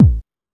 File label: Techno, EMU XL-7